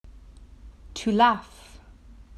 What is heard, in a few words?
To laugh